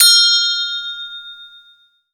bell; SoundsCrate

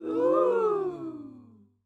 audience impressed ooo

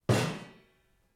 Metal Footfall; soundscrate

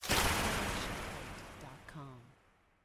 Spell Blast; SoundsCrate

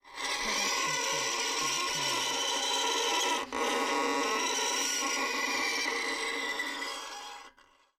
Creaky Screetchy Noise Long; soundscrate

